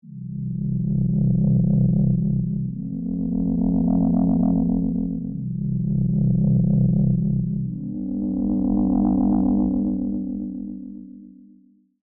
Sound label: Aqua Drone Chord